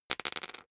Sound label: poker chip fall wobble short